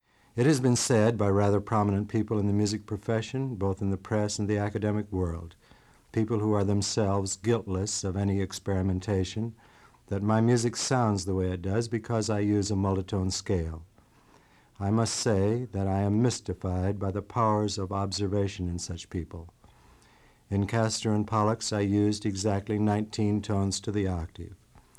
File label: tone